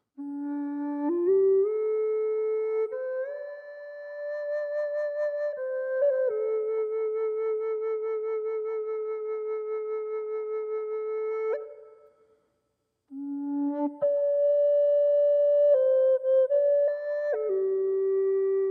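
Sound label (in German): D ohne Effekte, drone flute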